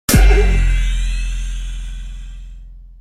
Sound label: shield start; nfs rivals beta audio